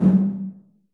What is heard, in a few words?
LR LC808, TR808